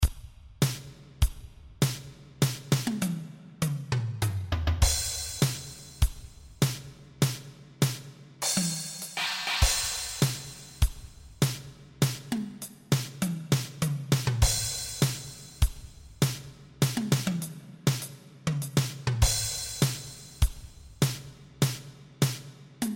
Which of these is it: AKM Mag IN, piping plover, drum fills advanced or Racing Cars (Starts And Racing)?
drum fills advanced